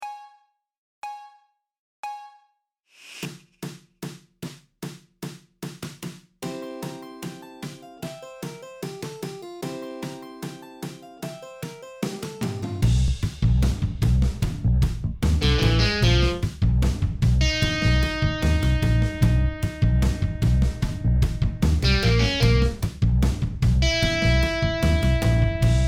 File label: electro rock song